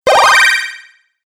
Classic Delay Game Hit, video game sound effects; Twistedtracks

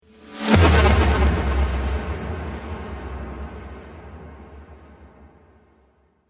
Trailer Hit Future Shock; Trailer Sound Effects

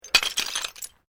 Glass move

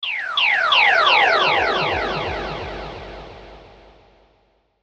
Sweeper Laser Delay; Blastwave

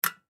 Lantern Turn Off Fast; Lamps; Household; foley; Lamp Sound Effects

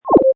Future, Button sound; Multimedia

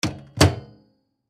Box Close Spring Decay; Snap Sound Effects; Objects; Foley

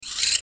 Transition Digital Stutter Ascend; Multimedia; Blastwave

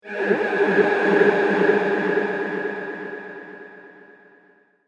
Powerful Laser Shield

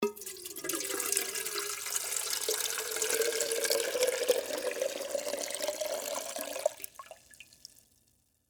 Water Pour Pitcher, Metal; Pitchers; Pouring; Objects; BlastWave

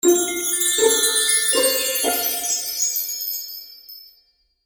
Magical Music Finale, Cartoon Music Sound Effects

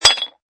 Impact Tile, Ceramic tile drop, crack, break; Materials

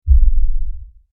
Lfe Hit; Hits; Sound Design; Production Element

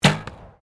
Archery Competition Bow Firing; Various archery sounds; Miscellaneous Sports

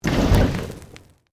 Created Magic Spell Fireball Impact; Series of created spell sounds

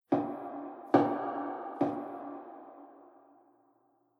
Cave Impact 3 Soft Thuds; Miscellaneous Impact Sound Effects

